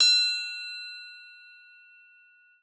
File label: TRIA; Xsample VOL-9